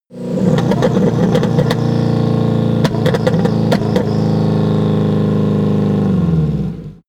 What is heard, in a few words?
car vw t1 splutter slow down